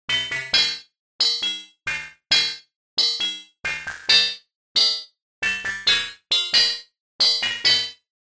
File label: Percussion Waves; Waverator Spectra; Peculiar; syntheway